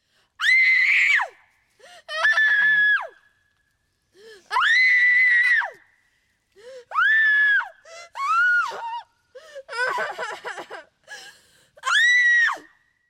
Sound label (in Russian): zvuki stradaniia cheloveka